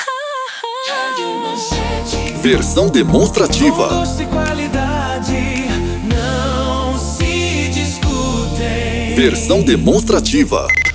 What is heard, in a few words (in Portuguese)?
demos cantadas